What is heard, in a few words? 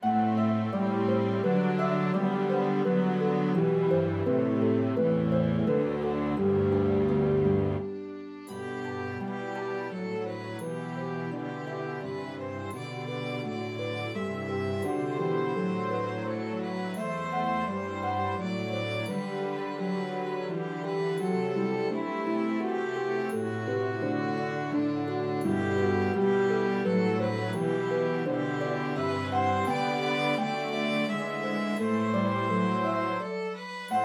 Violin Track with Melody; Away in a Manger